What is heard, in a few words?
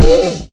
zombie horse hurt; Minecraft